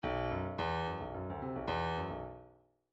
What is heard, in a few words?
bdf midi file grooves funk s funk s